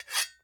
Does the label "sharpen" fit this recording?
Yes